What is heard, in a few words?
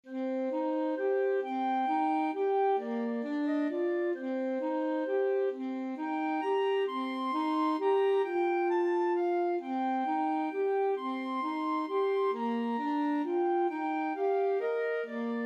clarinet saxophone duet